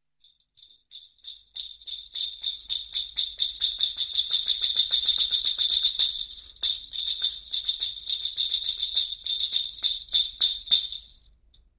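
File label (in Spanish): Cascabeles, Percusión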